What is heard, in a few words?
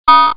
beep